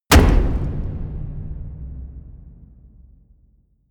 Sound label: hit wood, hits impacts